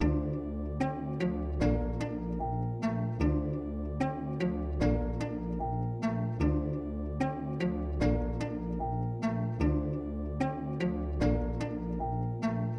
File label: Pluck 150bpm G min